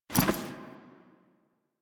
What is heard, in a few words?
Foley Idle Short; Ambience; Teshin; Duviri; Warframe